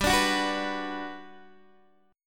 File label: G Major 7th, G, down, Guitar, DADGAD